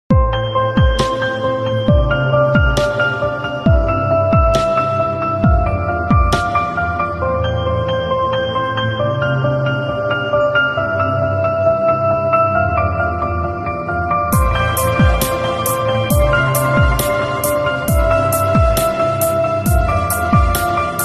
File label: Mobile Ringtone